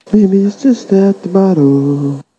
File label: singing